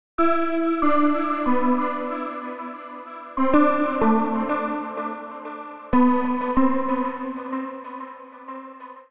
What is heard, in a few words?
E Minor 94 bpm, Melodic; Tonal; MIDI Clips; Uplift Upside; Drive and Glow; Ableton; Factory Packs